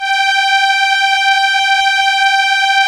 STR ELKA, Elka Strings, Elka Violins; Roland LCDP09 Keys of the 60s and 70s 1

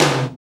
TOM TOM, Real

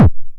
909 BD Tape Sat

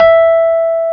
E 6, Electric Pianos; Wizoo

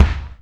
Kick, Alesis DM5

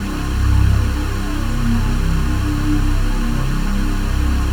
SEA PAD

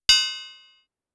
Triangle, Clavia DDrum AT, Pitched Down

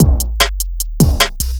Drum Loops, 150; KeepItRolling; M8; Electronisounds

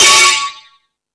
Rap Session, E-MU Pro-Cussion

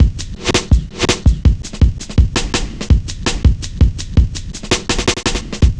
Cut Thru B, Drum Loops, Jungle, 165.5; Zero-G Jungle Warfare 1, 2 & 3

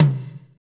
tom, fast shuffle, Roland; Northstar; Drumscapes Roland; Toms

